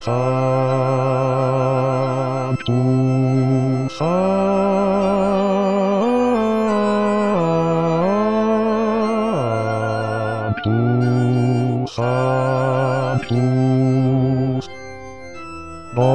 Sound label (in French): Sanctus Basse